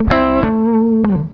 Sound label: FUNKY, Grooves; USB Soundscan vol.31; Miro s Grooves; AKAI